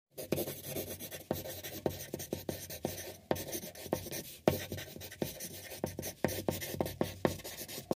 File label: The long sound of writing something on paper with